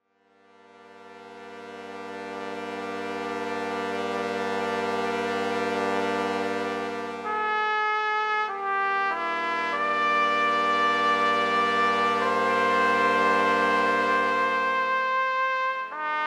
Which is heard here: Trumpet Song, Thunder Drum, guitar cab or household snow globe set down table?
Trumpet Song